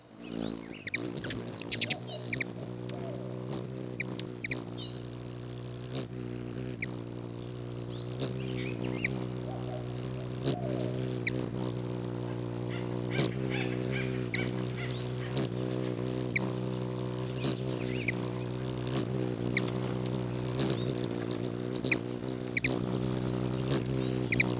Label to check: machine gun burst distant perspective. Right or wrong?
Wrong